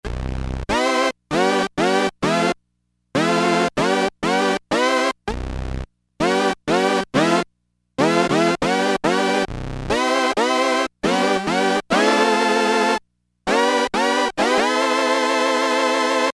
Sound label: Brass 2 - Slide Synth Brass, Crumar Trilogy